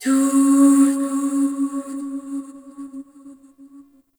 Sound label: Opera Note; Warframe; Ambience; Orokin Moon; Moon Int Opera House